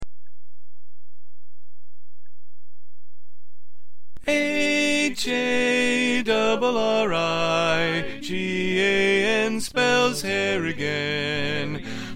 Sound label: Lead